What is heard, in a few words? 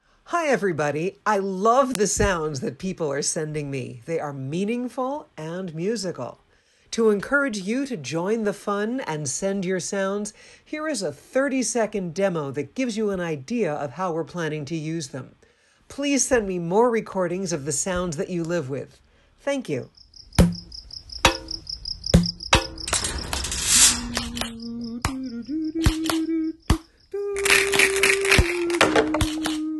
Home Percussion